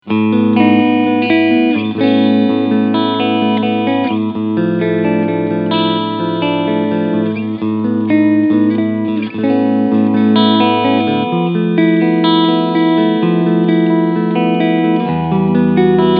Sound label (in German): Peavey HP2 Cleansound beide Pickups Singlecoil Mode